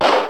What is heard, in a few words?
egg explode